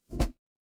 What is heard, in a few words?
ui swipe